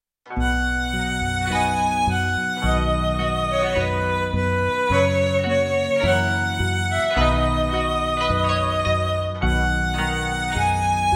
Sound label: 4string; newyearsday